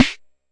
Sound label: Jomox MBrane, drum machines; Jomox MBrane 11